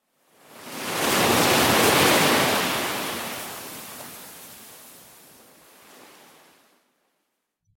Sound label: ocean waves crashing; sea